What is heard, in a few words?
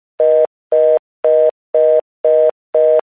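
sound of telephone dial tone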